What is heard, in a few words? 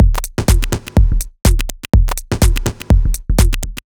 A# 124bpm, House Drumloops; Zero-G House Fabrik Drumloops